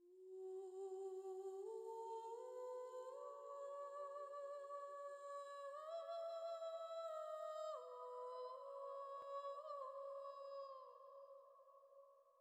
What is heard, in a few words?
quiet mellow soft female vocal melody 73bpm